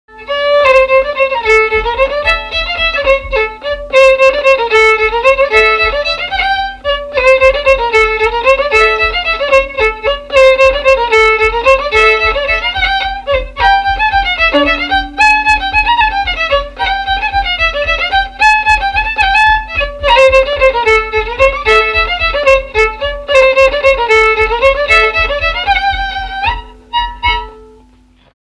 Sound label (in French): Bourrée deux tons